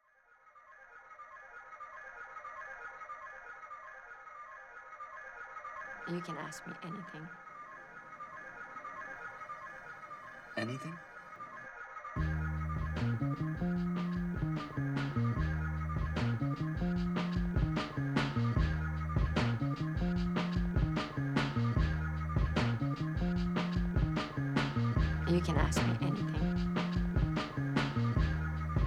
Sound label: break practice